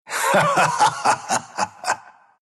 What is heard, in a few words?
Anti laugh, ru; Dota 2